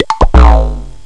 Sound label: bonk pop